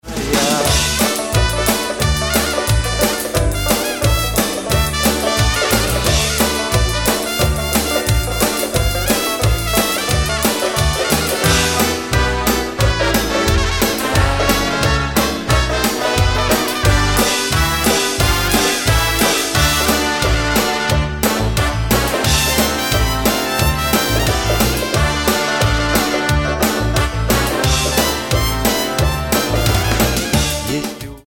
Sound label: trumpet